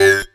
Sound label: Pride Combo